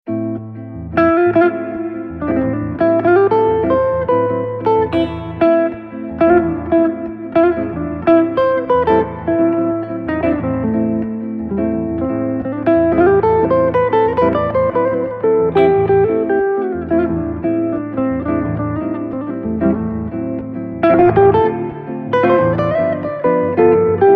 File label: Bossa in A Minor